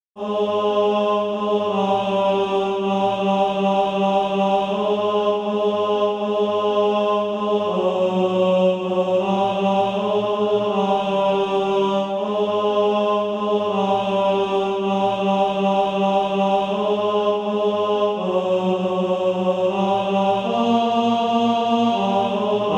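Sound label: tenor, SATB Practise Music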